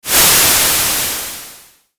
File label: noise fade; 2sec; dice demo